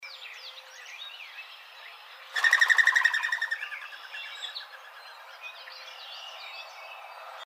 Mourning Dove, birds

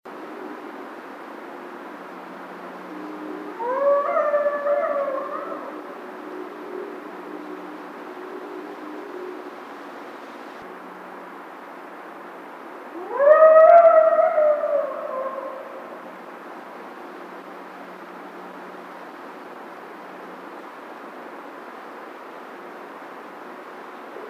howl, Coyote; Coyotes (Canis latrans)

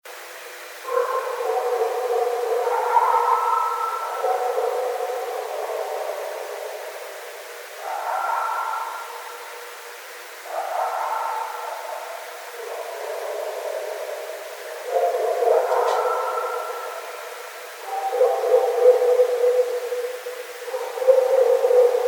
Scream

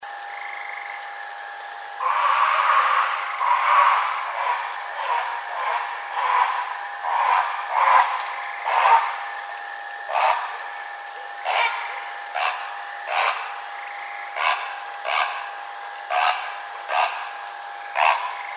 Great Blue Heron

